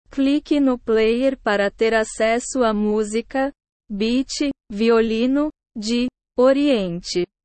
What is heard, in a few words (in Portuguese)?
beat violino